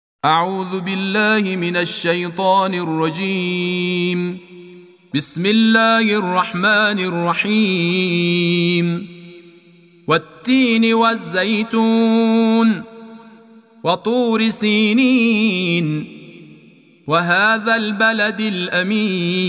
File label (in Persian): تلاوت ترتیل قرآن کریم سوره تین